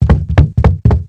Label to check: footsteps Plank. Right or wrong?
Right